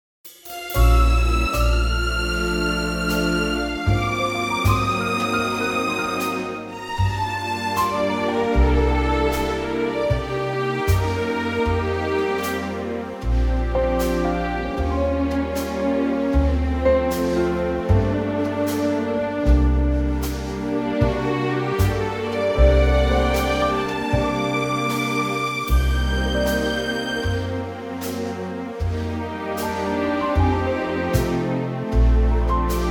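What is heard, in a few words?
Bb orchestra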